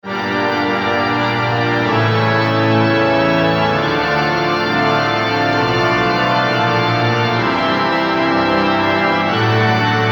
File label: memotron church organ studio; manikin electronic